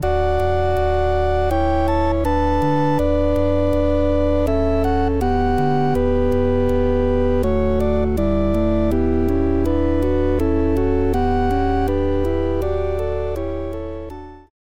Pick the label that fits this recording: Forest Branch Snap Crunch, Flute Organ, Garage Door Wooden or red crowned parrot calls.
Flute Organ